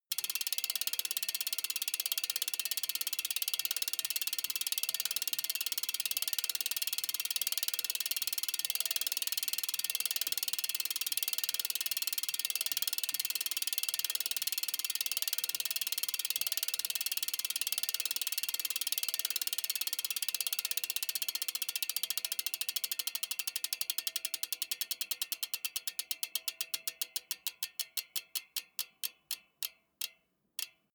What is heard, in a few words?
bmx bike freewheel constant medium